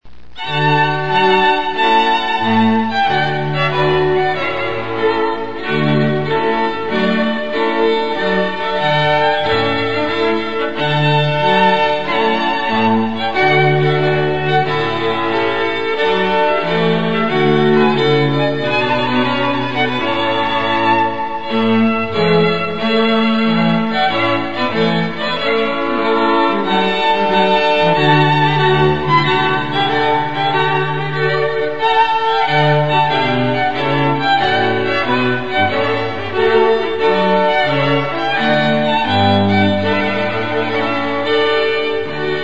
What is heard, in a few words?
Trumpet Tune